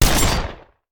Shotgun; Sound Ideas; Guns

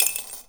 Coin Toss Into Tip Glass; Foley - Rattles

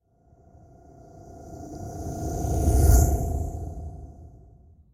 sea object; Blind Drive

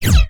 Space Gun; 1001 Sound Effects

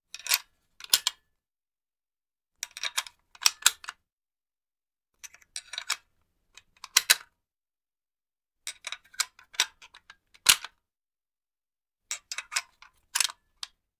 Rifle Foley; Sound Ideas; Guns

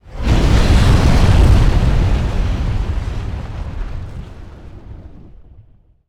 Volcano Lava Erupt; Destruction

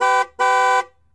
Truck Explorer Horn; Trucks

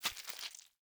step gore; DeadFright

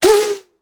Bullet Pass By; Sound Ideas; Guns

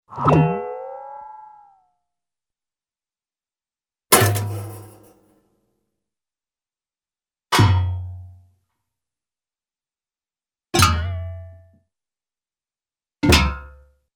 Light Ringing Metallic Stretch & Impact, cartoon; Hollywood Edge; Cartoon Trax

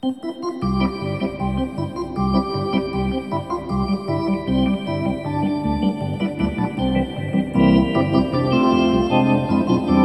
Hockey Organ

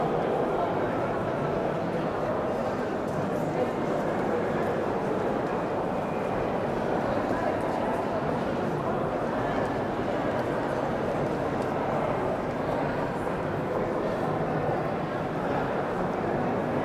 Urban people babble crowded reverberating; urban and suburban; boom library

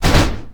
Menu Select

